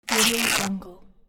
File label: ripping clothes or cloth